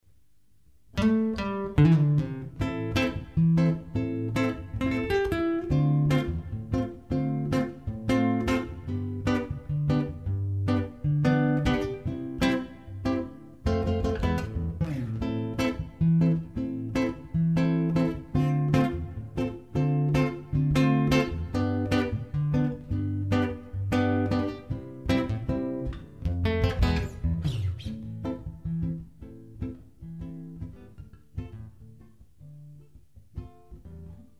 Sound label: latin rhythm